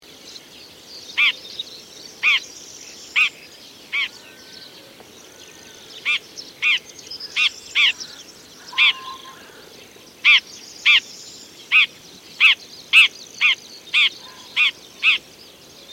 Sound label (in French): pie-grieche ecorcheur, chant